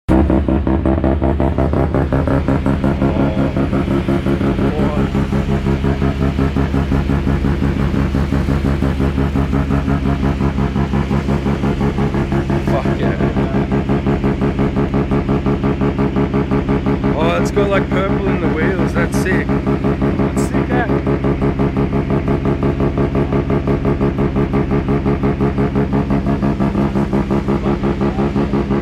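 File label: loud mazda rx7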